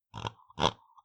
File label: pig idle